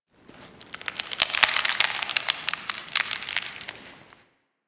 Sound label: Ice Creak Echo